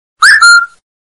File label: vivo y15 whistle